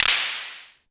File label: polyvox clap